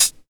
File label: Dark Tone Pedal Hi-Hat Sample A Key, Royality free high-hat sound tuned to the A note